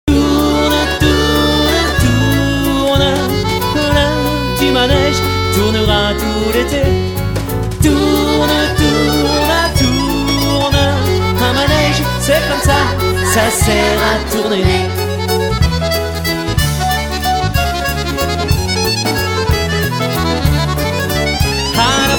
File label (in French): accordeon; ratatouille